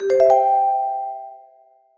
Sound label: Timer End; Ki11er Clutter